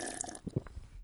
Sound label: drink; Space Juice